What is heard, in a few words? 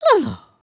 bow; emotes